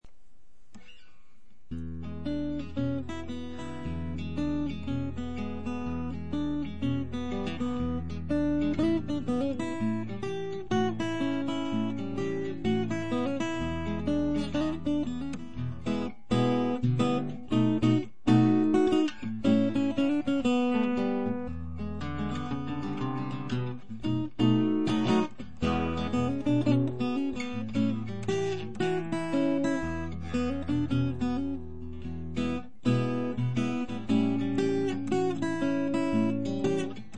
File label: blues